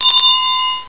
winding; The Official Ms. Metaverse Judge Handbook

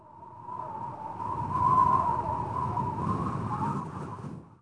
WIND, wind; PC Gamer IT CD 40; zones

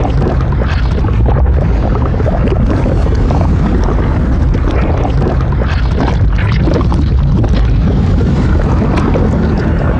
lava flow; DAoC; Toa; Gamestar

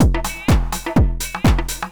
DRUMLOOP HOUSE 125; eJay

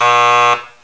buzz; Total TV

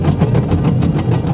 tank

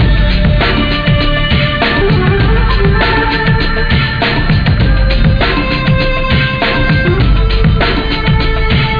Beat

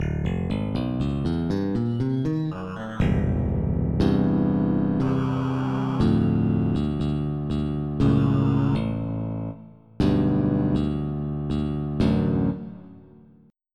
Stick Split

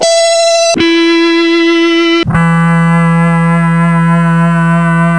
E Vibrato, guitar samples

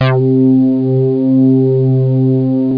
Phaser; Sound Library 2000; Wayzata Technology

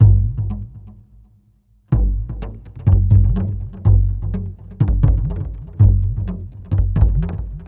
PERC LOOP ETHNO 125; eJay

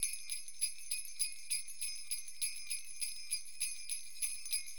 SLEIGH, Perc; LiveAct Pool 1

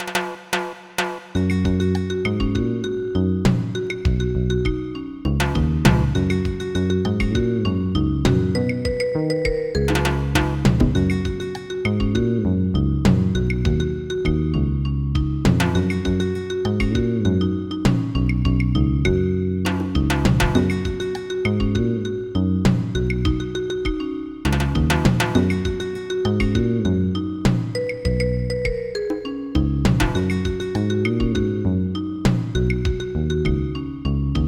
Reggae

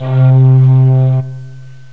syn. calliope, synth lead; gmplay

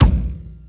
ARROW; DarkOmen

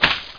Successful break; Curse of Dragor

